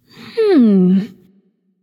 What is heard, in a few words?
thinking light; smite; voicepack; hel